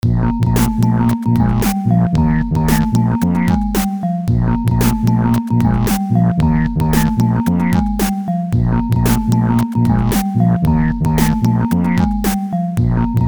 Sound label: beat